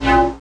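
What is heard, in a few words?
spell create; The Elder Scrolls III - Morrowind; Morrowind GOTY GOG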